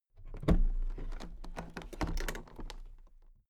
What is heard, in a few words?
toolbox open soft